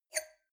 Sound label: wine glass grab table slide medium short; soundpool